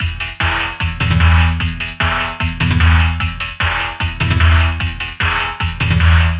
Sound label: Roboatic Strangeness With Drums 150BPM